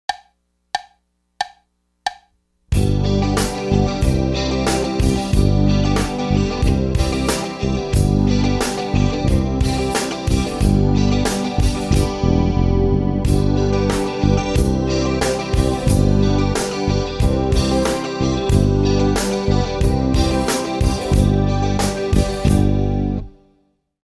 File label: open close, drums, open hi-hat